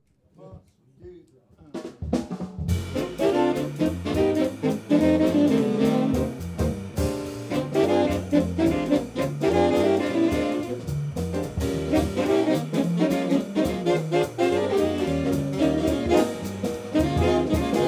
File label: very saxy; 4bros Demos